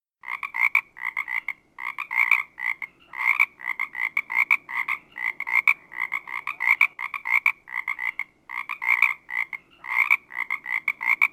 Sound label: animal sound Frog